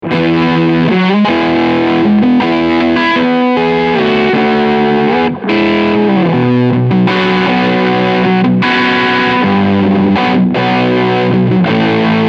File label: Dirty Drive